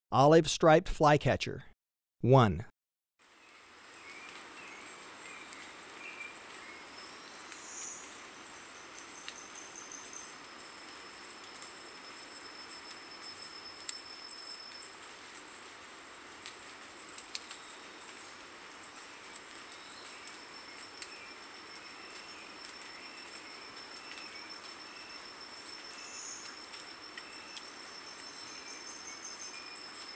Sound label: Olive-striped flycatcher; S. America